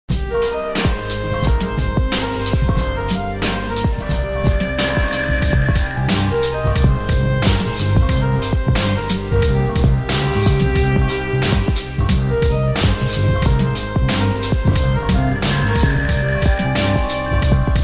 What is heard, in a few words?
instrumental ringtone hindi